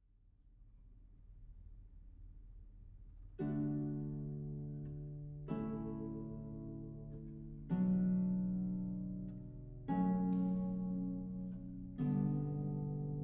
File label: Harpist Pachelbels Canon